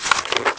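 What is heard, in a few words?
book page; The Elder Scrolls III Morrowind GOTY; XBOX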